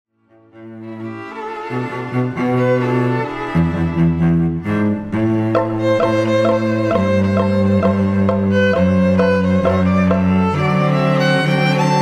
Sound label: cymbal quartet